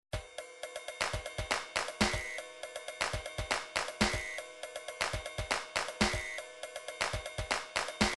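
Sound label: drums pattern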